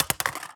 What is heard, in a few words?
phys mag drop ak poly concrete, magazine drops